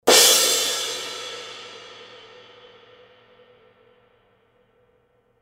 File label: Kerope, cymbal sound